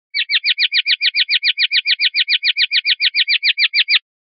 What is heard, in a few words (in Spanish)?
rep sonajas